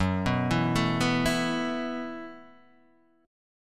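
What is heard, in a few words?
B 7th Suspended 4th G flat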